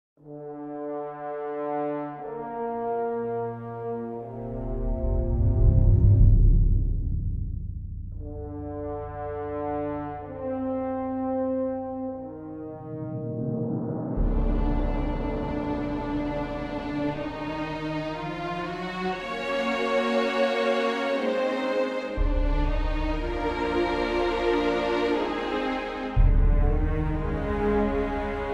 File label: World Music